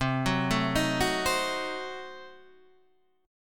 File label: C Diminished 7th C down Guitar Standard; arpeggiated; JGuitar